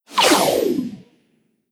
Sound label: Se Sys Timeattack Icon Rank Down